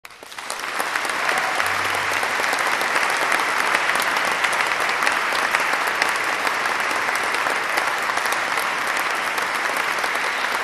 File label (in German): APPLAUS; Vokalensemble und Frauenchor; Klavier